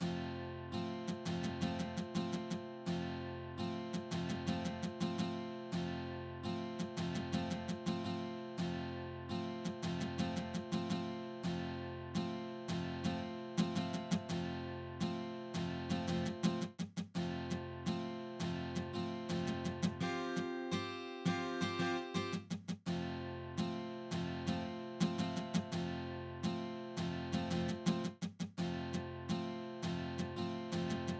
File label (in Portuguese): violao kontakt; mcrangel